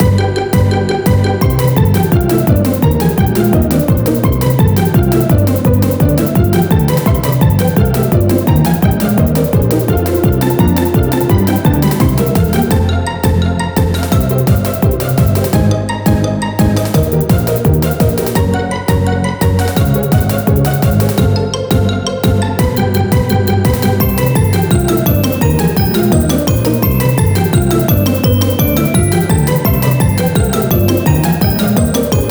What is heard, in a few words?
Lazer Gremlins Music; Birthday Party Bash; Wii